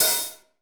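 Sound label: HH, hi-hat; Best Service; Hyper Dance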